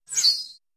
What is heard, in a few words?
Arrow Whistle Bys, Medium; Arrow Zing By Close Up. - Archery